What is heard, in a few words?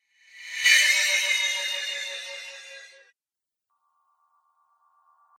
Ufo Spinning Zoom, Spacecraft; Spacecraft Sound Effects